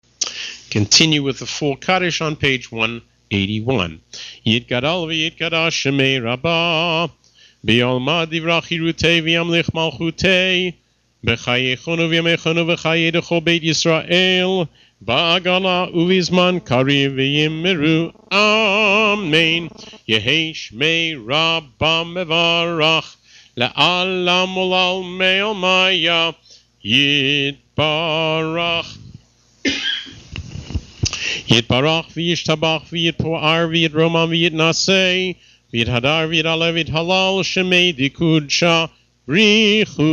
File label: Musaf Kaddish Shalem